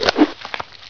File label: headcrab fast attack; hl2